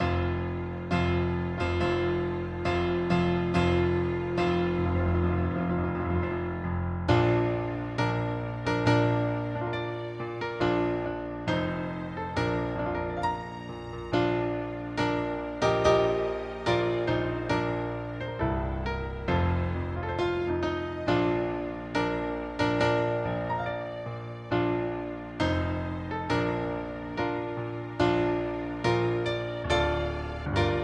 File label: wedding march entrance